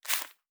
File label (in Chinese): 书店内 旋转信纸